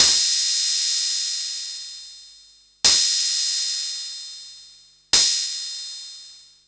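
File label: cymbal, drive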